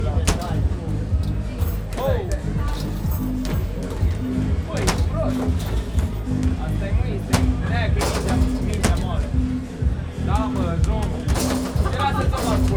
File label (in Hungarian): kosarlabda vidampark